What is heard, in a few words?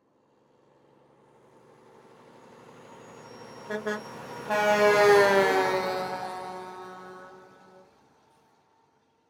Semi Truck Mac; Blastwave